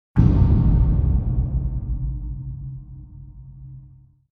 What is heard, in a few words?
Trailer Hit